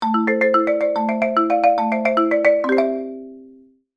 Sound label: marimba ringtone